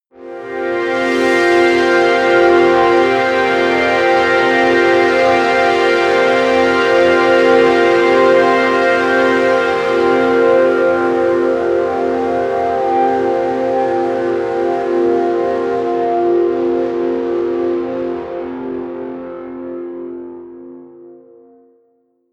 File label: promo pad; flaechen